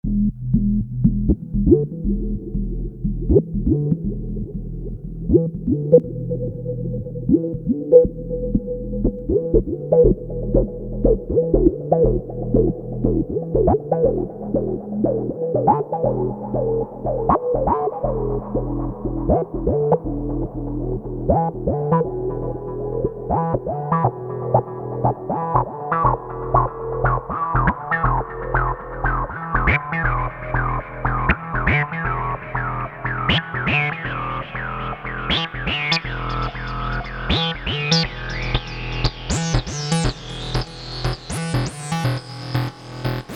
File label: kawai k3 sounds, mystic acid external fx; digital waves into analogue filter